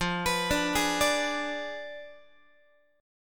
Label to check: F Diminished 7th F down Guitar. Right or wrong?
Right